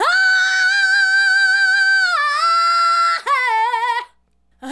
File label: Adlib, Hip-Hop and RnB Vocals